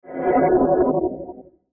Sci Fi Portal Warp; Blastwave

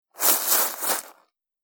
Packaging Paper Noises; Paper Sound Effects; Foley Sound Effect; Andrew Fleming

